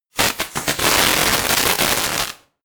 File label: sparks synth dense